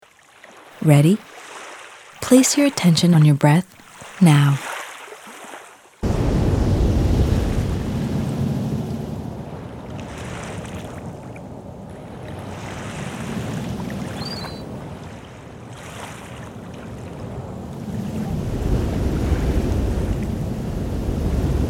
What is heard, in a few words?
beach sample